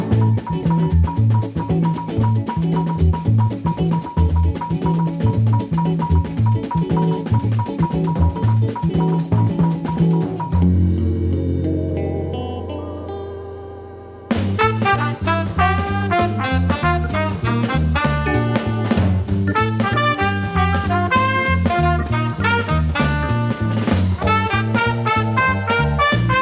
samba jazz

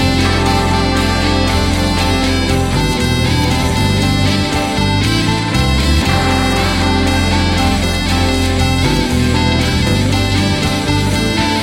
Bell

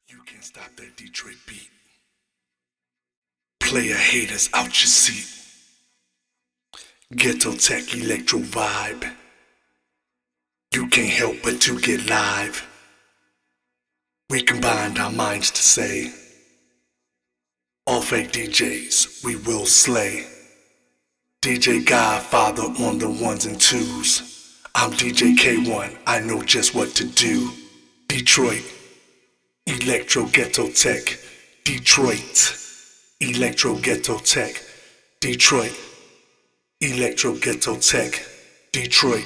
Detroit Electro Ghetto Tech Acapella

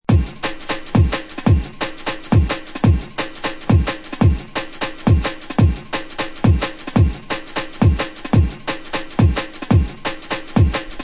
Dread Jungle Step, beats; ray keith dread jungle breaks vol 1 60 beats for dubplate clashes battle junkies

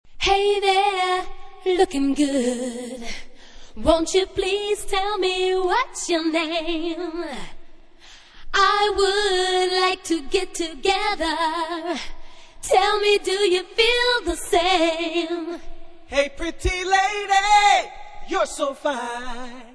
original acappella version

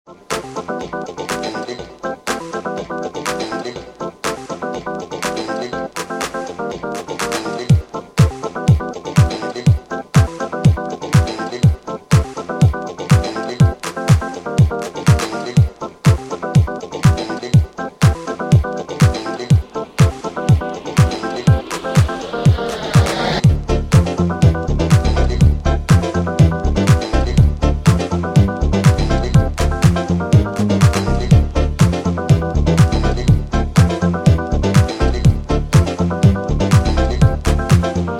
FLUTE HOUSE MIX

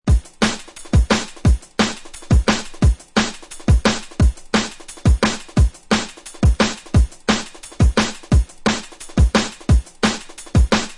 Step

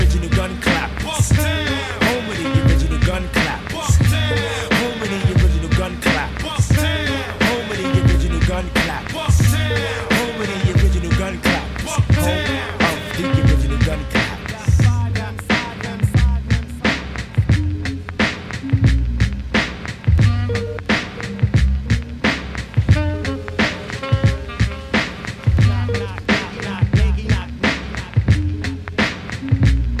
(instrumental)

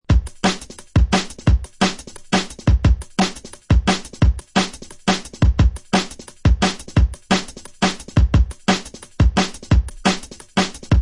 Dread Tight Intro Steppaz Break, beats; ray keith dread jungle breaks vol 1 60 beats for dubplate clashes battle junkies

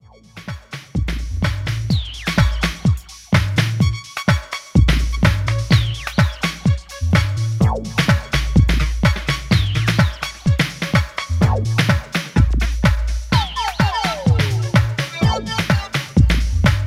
Slow Rotor Space - Disco (Instrumental)

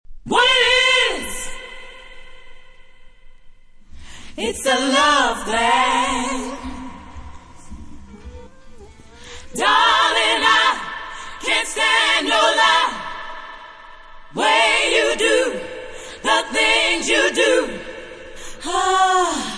original background vocals acappella

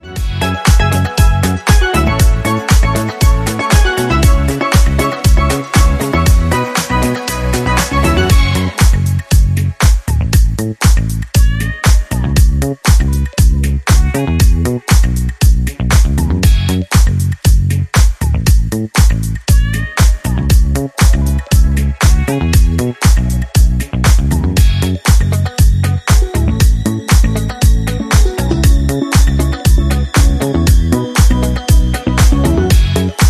Instrumental

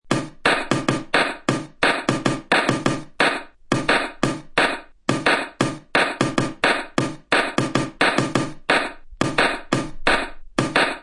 Kik An Snare; jungle breaks; ray keith dread jungle breaks vol 1 60 beats for dubplate clashes battle junkies

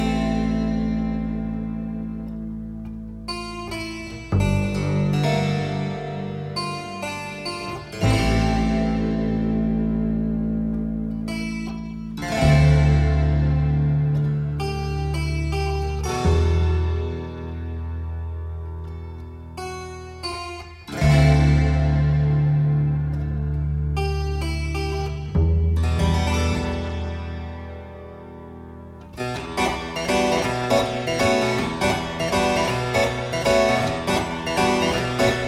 Harpsichord Waltz

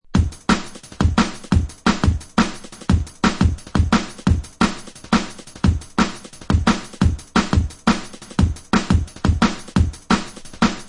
Dread Fill Breaks; ray keith dread jungle breaks vol 1 60 beats for dubplate clashes battle junkies

